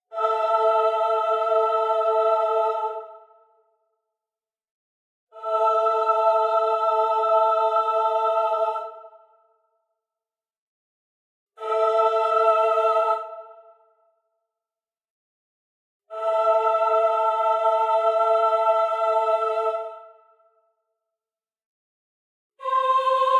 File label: Voice Choir Female Aaah; gamerip; Hell Warders